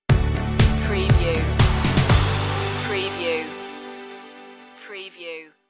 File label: jetgirls stinger